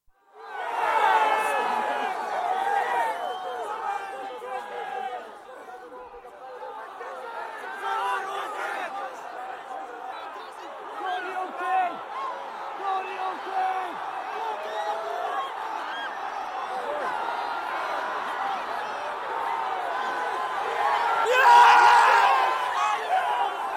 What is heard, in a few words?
National Hunt Racing, excited crowd at finish, also distant PA with audible results (numbers only); excited crowd at finish of 1989 Cheltenham Gold Cup, 1989; BBC